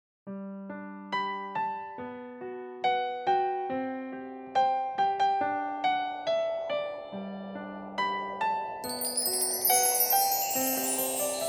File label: karaoke